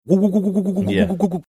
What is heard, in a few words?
making wobbly spinning sound saying yeah